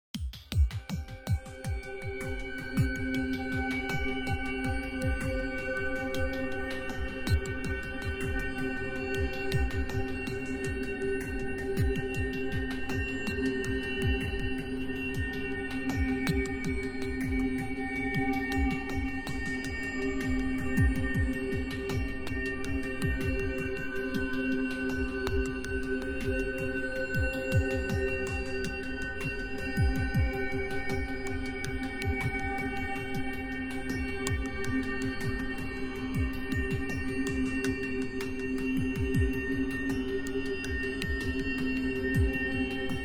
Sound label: Texture Pensive Gliding Shimmer Pulse; LYN115 Trailer Production Elements